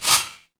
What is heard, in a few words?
impact sword draw